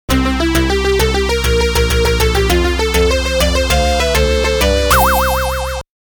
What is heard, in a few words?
Game Start; Namco Arcade Sound Digital Collection Vol. 1